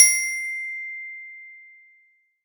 bell

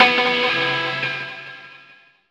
Citizens

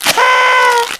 roll horn; ui; birthday party bash; wii

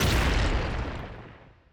Janken Slash Rock

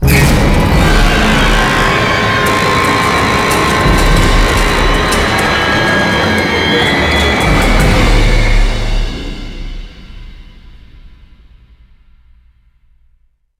RN Stinger; Dead Space; PS3; Xbox 360

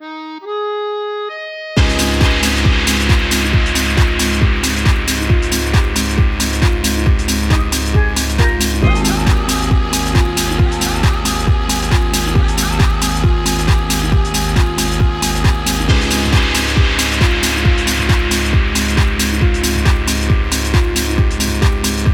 7pm Beat; Nostalgic Nintendo Beats; Animal Crossing New Leaf

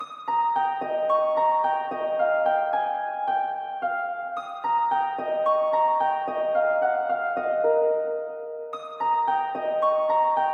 Harp; Toki Tori 2

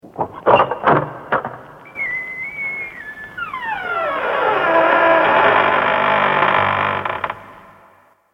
Scary Creak

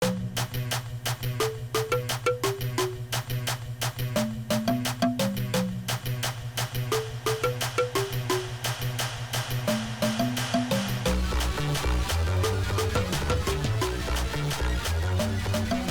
Action DNB; crab champions 2023

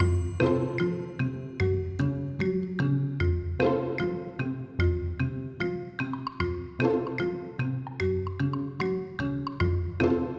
music time stop loop

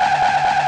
Tire Squeal Loop; blastmorph pinball; xbox series xs

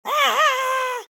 Shout

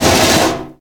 Metal Sheet Move; Prison Architect

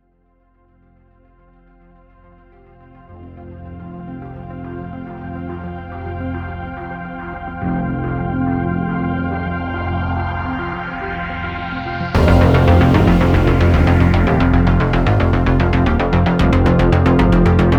Action No Drums

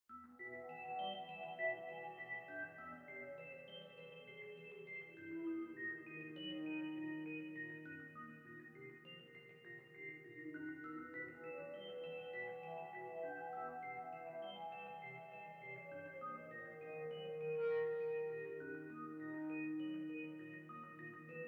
Dark organ; To Hell with the Ugly

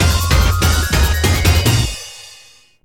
Stage Clear; psikyo collection vol. 3; Zero Gunner 2

